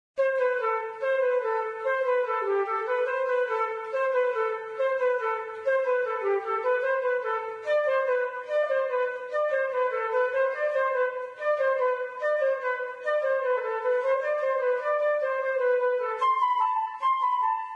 Flute; Zomboid; Lifestyle

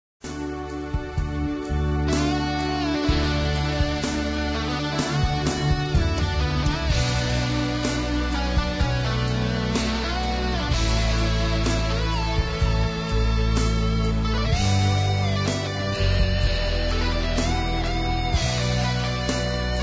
Keytar; Zomboid; Lifestyle

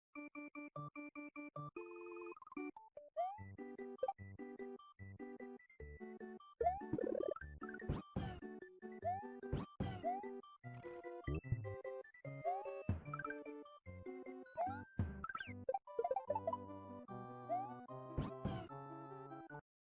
game SNES; Zomboid; Video Game Consoles; MarioWorld

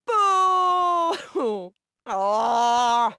Woman Booing; Zomboid; Lifestyle

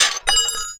Breaking Metal Weapon; Scrap Weapons; Zomboid

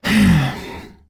Woman Frustrated; Zomboid; Lifestyle

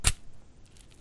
stabbing; Zomboid; Player Traps

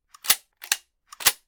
Firearm M60 Load Stop; Firearms; Zomboid

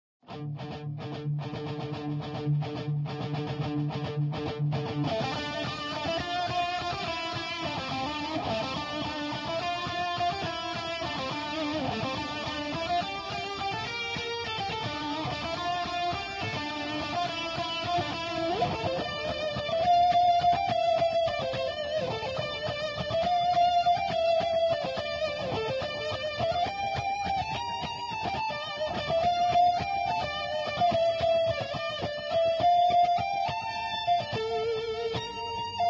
Guitar Electric; Pirates of the Caribbean

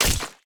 Blade; Scrap Weapons; Zomboid

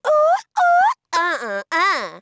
Woman Dance Happy; voice; Zomboid; Lifestyle

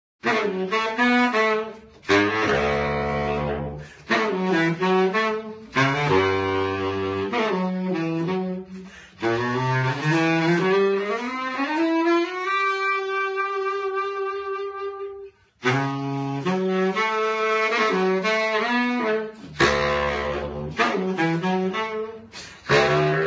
Saxophone

